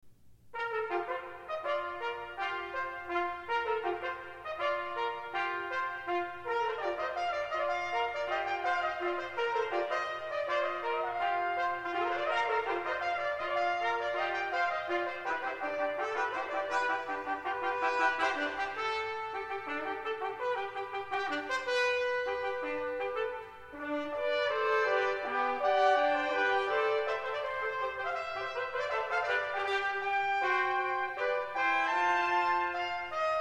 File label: Fanfare; Grade IV+